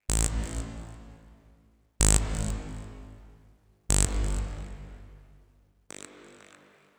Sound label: Oneshot Synth